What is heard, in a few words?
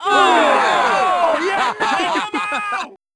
foul, Crowd; English; Freestyle Street Soccer; XBOX